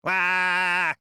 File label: wahhh; monodam